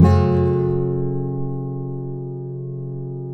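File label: nylon guitar down minor G, flam chords; Spectrasonics; Hans Zimmer Guitars VOL-2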